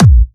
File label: Trance Kicks, Bassdrums